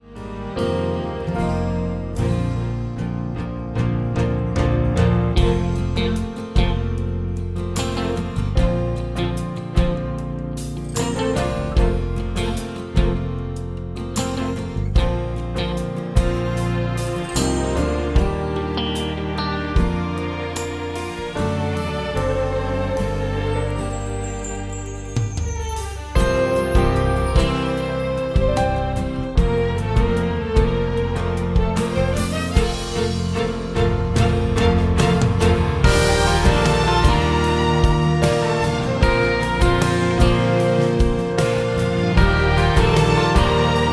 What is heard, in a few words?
Accompaniment